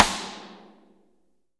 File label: RIM; Best Service; Real Mega Drums VOL-1